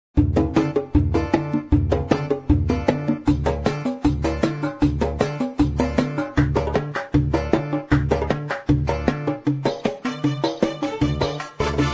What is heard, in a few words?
khebiti, arabic; pa80; korg